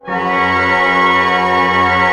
PENTA C PP, orch penta; Sound On Sound; Peter Siedleczek's Orchestra

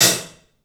PAHAT, 3D Drums, LITE CLUB HT; 3D Sound

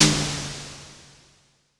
Drum X FX, Pearl

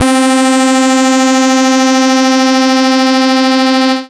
Synths Note C

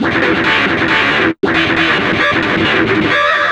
GTR, Chemical Beats

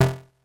Snare, Korg DS-10

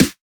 Snare, Dance Drum Kit

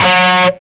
G 4, Soldano rock guitar; Spectrasonics; Hans Zimmer Guitars VOL-1

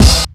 Kick Crash Combo, Kick One-Shots; KJ Sawka - Drum n Bass & Beyond; Drum Tool Box

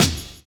JAZZY; Univers Sons

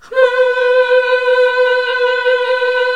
HMM C3, CLASSIC VOX8

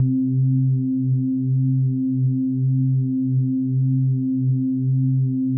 C3, synth basses; Music Store Sound Service; Einstein

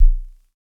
Subbass, Univox SR-95

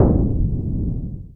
Perc Hit, Noise

